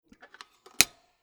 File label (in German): Toaster Zuklappen; Toaster ELIC